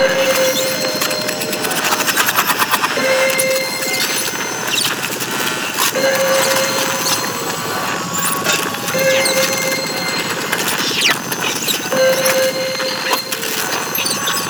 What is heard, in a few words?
Signal Loop; Mod Quest; Warframe; The Teacher